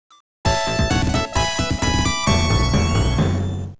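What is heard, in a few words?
level up; n21 sonic classic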